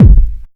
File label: small reverb bass drum one shot G key; Duration 0:01; Samplerate 44100 Hz; Channels Mono